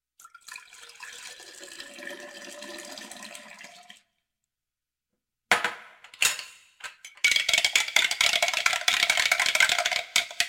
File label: Magic Potion; Halloween Havoc - Sound Effects; Ktel